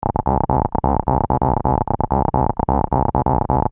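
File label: HOUSE line b, Loops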